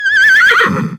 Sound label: Horse Neigh; Cinematic scenes